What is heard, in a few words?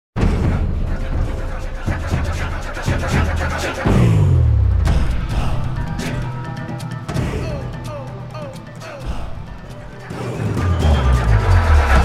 takeover stinger; music; stingers